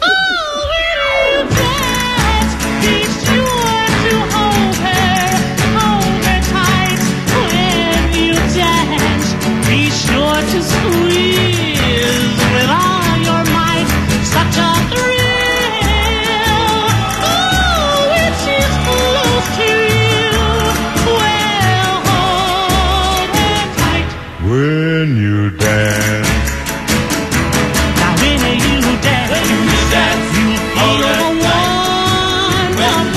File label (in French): Chanson Twist